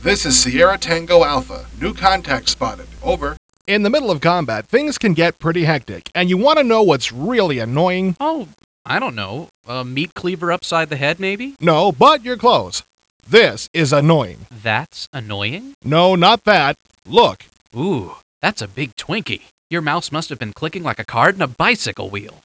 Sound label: HARP